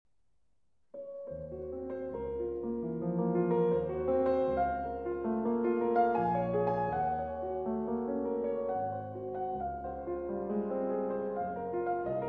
Intermezzi, Andante Non Troppo E Con Molto Espressione in B-Flat Minor